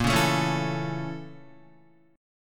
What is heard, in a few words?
Bb Minor Major 7th Flat 5th Bb down Guitar; BbmM7b5; strummed; JGuitar